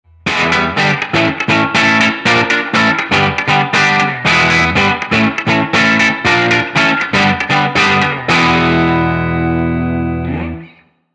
HB Brücke; Cyan Durango

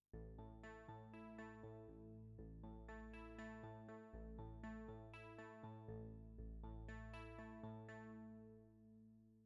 mit Midi Kabel; Cordial

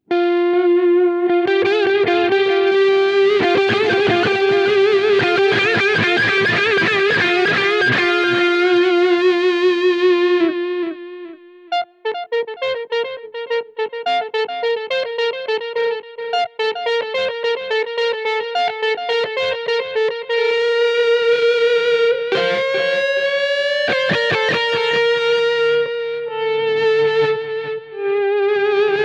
Schecter Lead Volume Poti Check; Rob Scallon

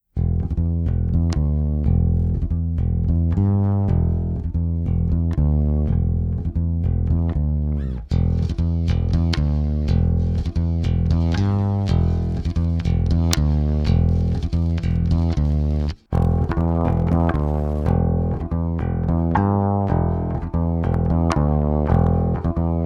OB1-500 EQ 12 Uhr Boost High Mid Low Cut Low Mid High; Godin

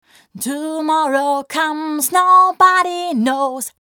EQ Gesang

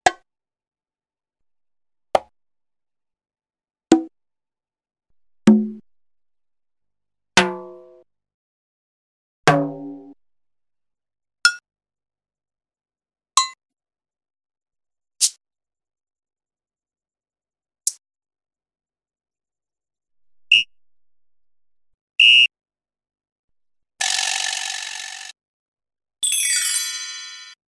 Einzelinstrumente

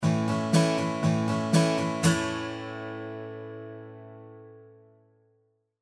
Gitarre Dry

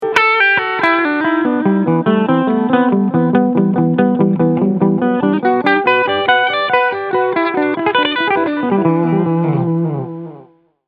Morley AFX-1 Echo 3 Uhr

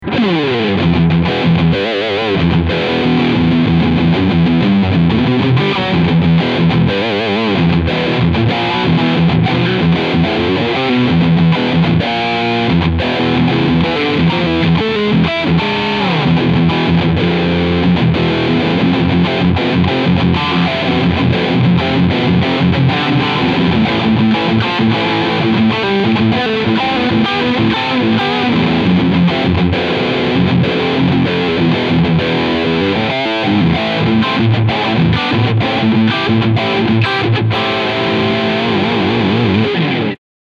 Ibanez RG657 Riffsound Steg Pickup

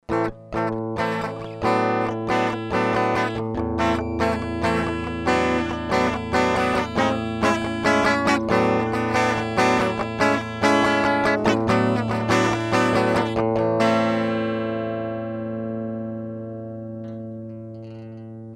ESI Amber i1 HiZ Gitarre

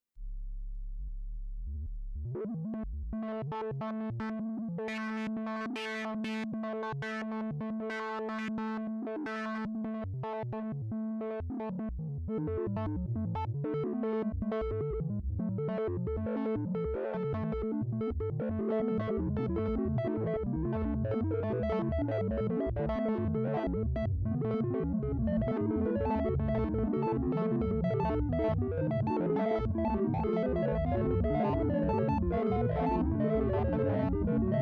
blubber; parva

